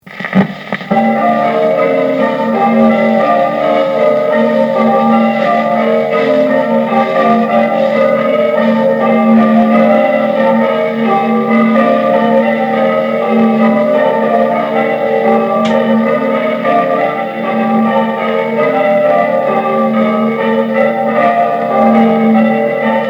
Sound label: Plain Course Grandsire, bells